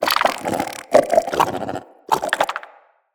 Shrimp Lantern Vocal Idle, Creatures; Baliq

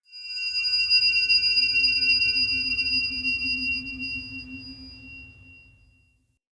Witch Station Ruins Soul Chime; Ambience; Soul Plane

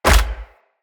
Bow Ranged Shot Punch; Weapons; Envoy Hunter Bow

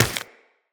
Run Flesh, Footsteps; Characters; Tenno

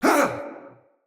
Pain, Battle; Mendicant Wazard

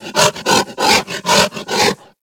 Kubrow Feral Vocal Breath Run; Enemies; Warframe

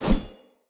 Short Sword Whoosh; Weapons; Swords; Sollos

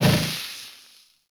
Boss Laser Stop; Warframe; Caliber Chicks

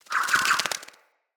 flock bird attack dive; Characters; Enemy; Abilities; Sinecure; Ranged Hunter Thawtide

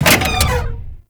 Thumper Bot Leg Damaged; Warframe; Grineer

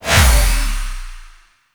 Rifle Void Mode Switch; Weapons; Warframe; Zariman

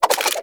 Clip Spin, Weapons; Warframe; Tenno; Dual Soma

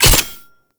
Incarnon Wrist Gun Low Clip Mech, Weapons; Warframe; Tenno

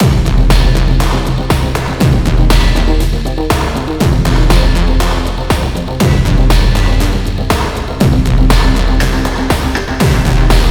Login Music; Login Screen; Venus Orb Vallis

